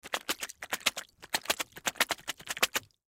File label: Splash Puddle, Water Splash Puddle Multiple Small; Splash Sound Effects; Splashes; Objects; Blastwave